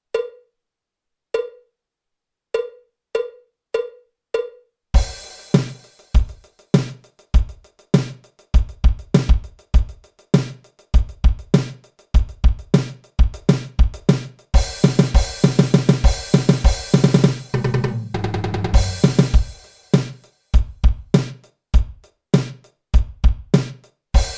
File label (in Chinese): 架子鼓独奏 芬克摇滚风格; 66条架子鼓独奏SOLO